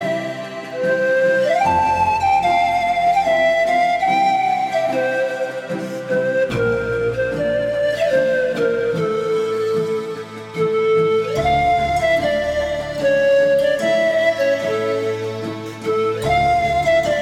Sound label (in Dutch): panfluit